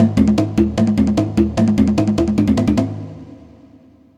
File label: musical instrument